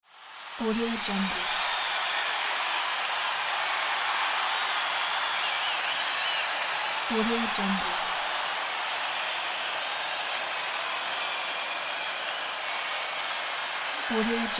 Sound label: crowd cheers